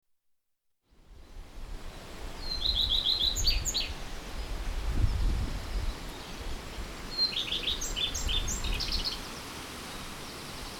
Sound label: Redstart